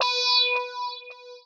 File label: trance lead